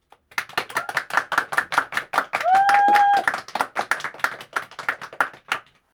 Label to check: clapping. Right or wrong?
Right